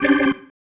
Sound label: Stab, Organ, One Shots